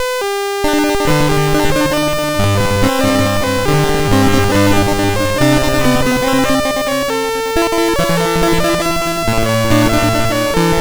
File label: Randomly generated music BASIC, sid MOS6581R2